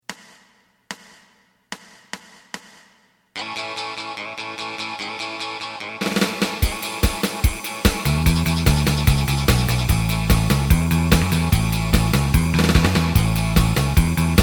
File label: lead